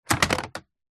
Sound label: household telephone landline plastic unit set down table receiver fall off